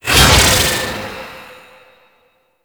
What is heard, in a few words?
Psychic Bolts; Warframe; Nyx